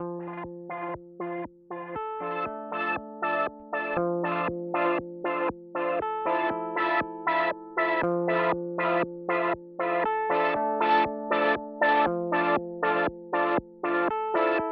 Strymon NightSky

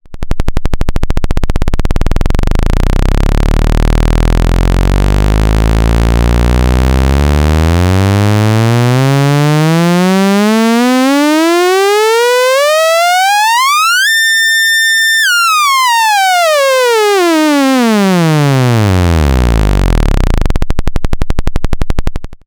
MOOG Mavis VCO Range SAW

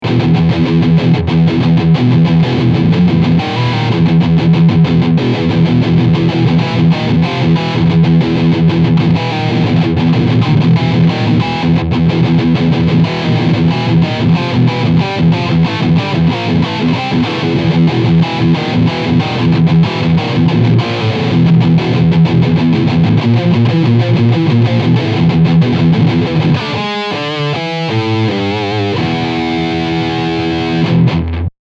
Mid FAT

